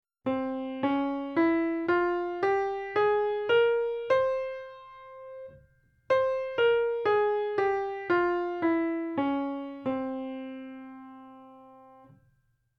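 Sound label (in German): Harmonisch Moll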